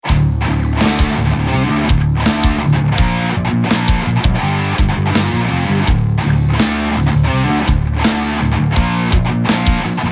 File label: BG Music; Fight Angel Special Edition